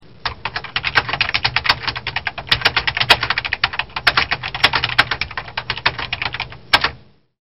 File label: keyboard